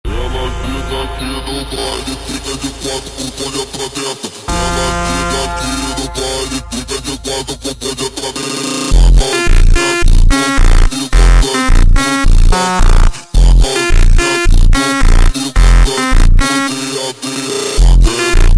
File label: super Slowed, funk